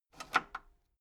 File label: metal lever button radio disable slow